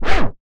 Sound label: OWWW; Northstar - New Gold VOL-2; RAP CITY 2